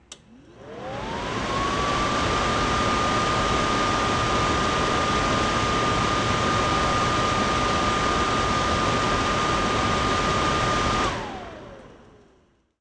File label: fan on off